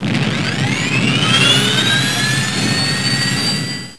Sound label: activate, jetpack; quake2